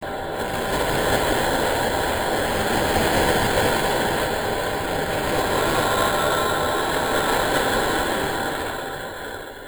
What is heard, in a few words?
sandstorm; Sonic Foundry (Sony Creative Software); Ugly Remnants VOL-2; UR 2 FX (Long)